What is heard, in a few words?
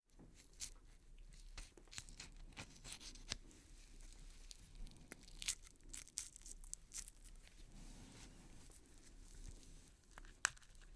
ripping pulling meat